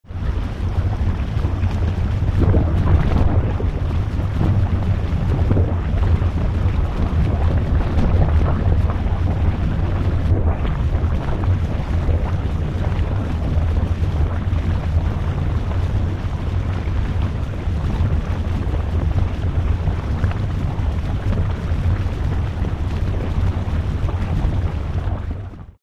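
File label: geyser sounds before eruption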